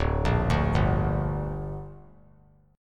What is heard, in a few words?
D Suspended 4th Sharp 5th D down, chord; arpeggiated